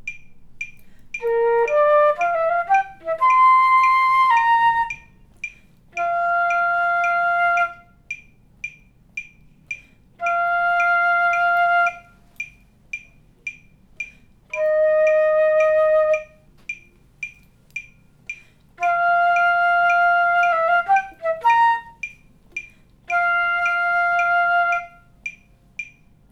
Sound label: Flute; Bayside